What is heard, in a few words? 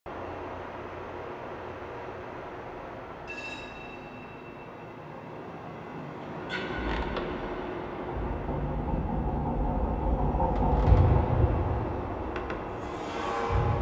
Tailor Shop Large Bed Loop; Interior; Warframe; Nineteen Ninety Nine